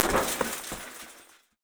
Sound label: car window; 3D Sound - 3D Keys VOL-1; MEAN 3D